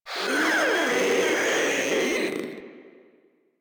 Proto Infested vocal attack

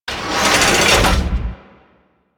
Vent Finisher Pull Vent; Warframe; Venus; Orb Heist

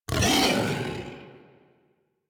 Feral Vocal Angry Attack Charge; Warframe; Kubrow

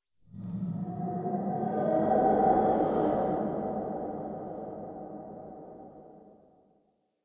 Exterior Distant Calls Scared, Ambience

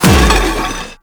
Shield Scrape; Warframe; Weapons; Melee; Whooshes

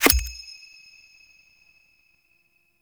Animal Marker Selected, UI; Warframe; Conservation

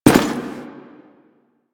Step Default; Warframe; Quests; The New War; Veso

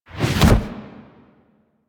Camera Latch; Warframe; Quests; Chimera Prologue

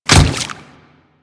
Water Step, Footsteps; Royal Guard

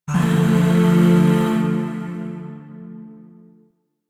Shawzin Maj Chord B; Music; Chords; Warframe; Zariman; Void

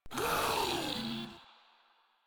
Infested Ancient Vocal Pain; Warframe; Proto Infested Ancient

